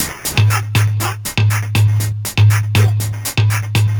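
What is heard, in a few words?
120 No Bass Drum Snare, Drum Loops; Abracadabra; 120bpm; Nu Dance Electro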